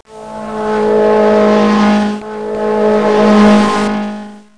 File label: buzz